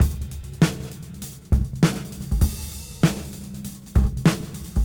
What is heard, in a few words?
Cym Beat 99 BPM Rev